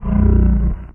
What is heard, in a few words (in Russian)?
udar